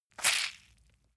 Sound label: leather impact mud medium squeeze